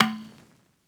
conga; eddyflux; dough-samples

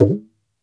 gumki, mrid

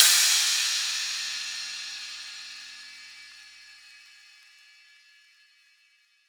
crash; eddyflux

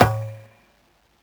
bongo; eddyflux

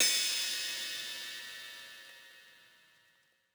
ride; eddyflux

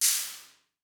shaker; dough-samples; eddyflux

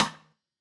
rimshot; eddyflux

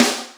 Boss DR660 sd

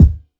kick; eddyflux; crate bd; dough-samples

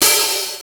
OPEN HH, LinnLM2; drum machine

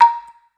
block session perc; eddyflux

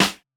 snare; dough-samples; eddyflux

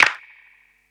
clap; eddyflux; dough-samples

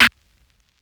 snare; dough-samples; eddyflux

